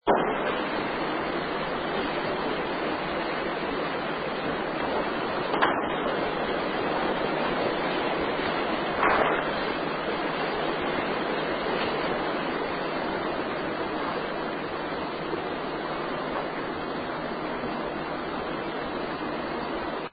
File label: museum noise not very clear